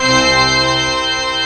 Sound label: PIPE ORGAN C4; ORG MIX